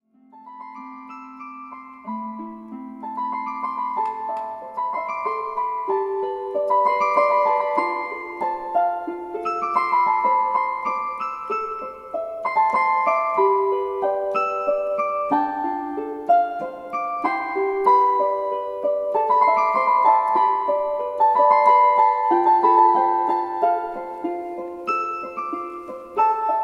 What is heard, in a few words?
Improvisation